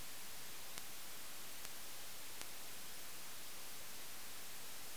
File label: 3 clicks 8 quiet; sonic-annotator